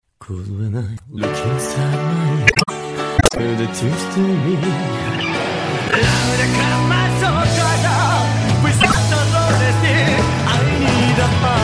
s8 feel the fire ringtone

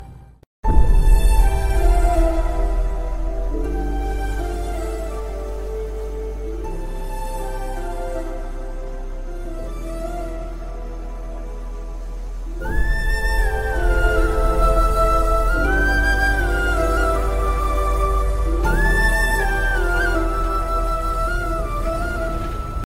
sad love failure ringtone

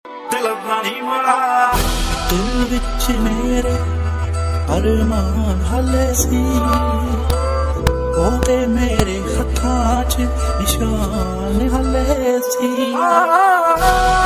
punjabi ringtone

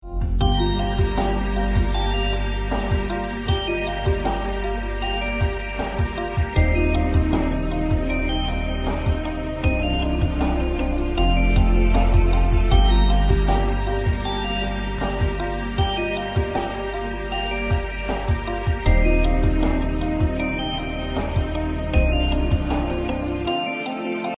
amazing apple ringtone

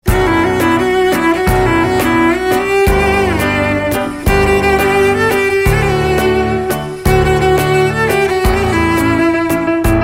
sad piano ringtone

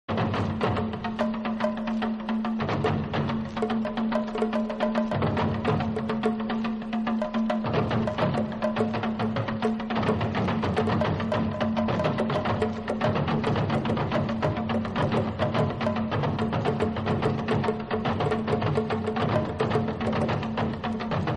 african rhythms ringtone

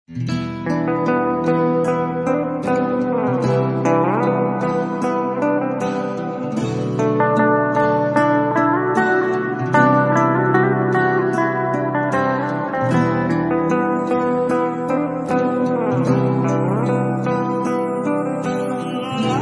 instrumental

